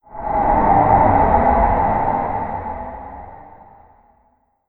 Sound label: Gargoyle Pass Fear